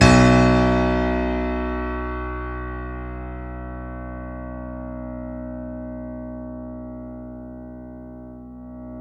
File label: C7; East West; The Ultimate Piano Collection